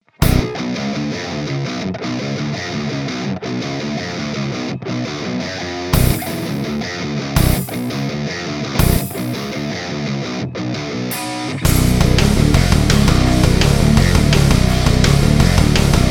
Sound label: Mesa V30 Ribbon160